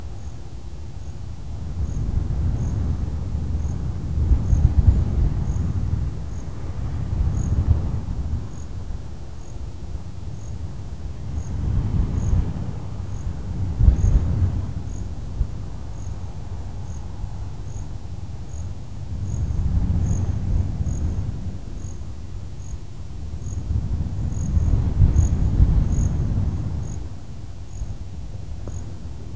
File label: crickets